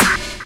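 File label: snare drum hip hop; DRMKIT; E-Lab; X Static Goldmine 2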